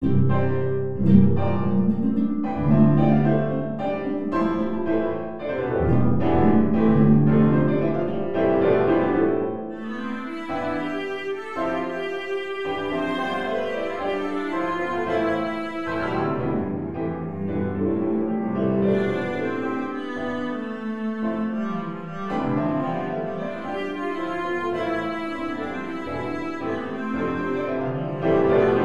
A Sprightly Tune, oboe cello piano

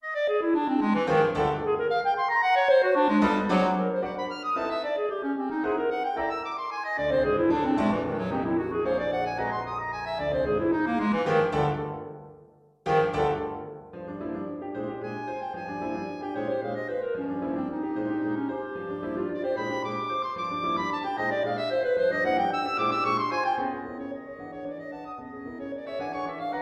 Patterns for Clarinet and Piano Arpeggio Energico

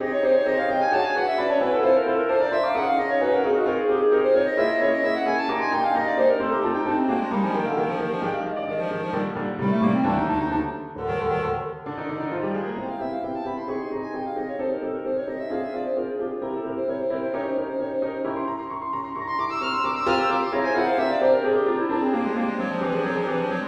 Patterns for Clarinet and Piano Scales Allegro

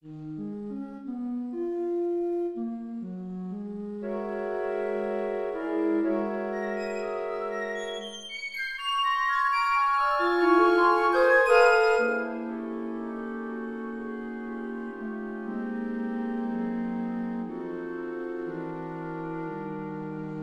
Pointillism, flute choir

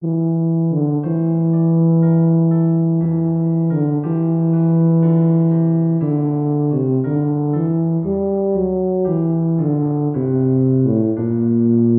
Elegie, Tuba

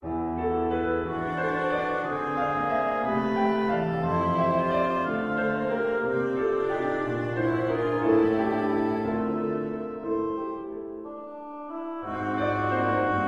Love Found Love Lost, oboe cello piano

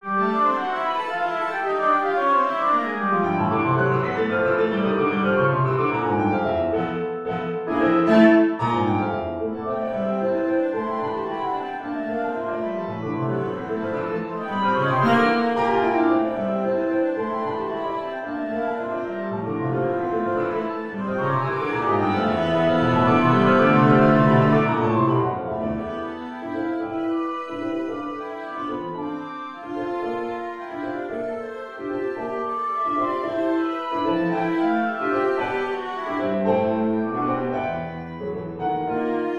Twelve Tones; oboe cello piano

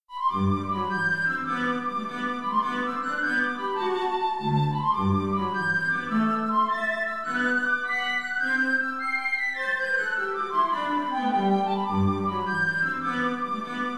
Jig; pennywhistle or piccolo

